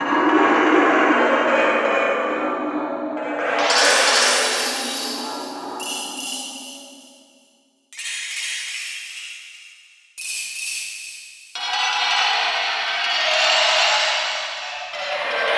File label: Flexatone Rattle